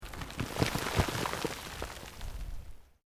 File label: EARTH LOOSE MOVE LONG, Debris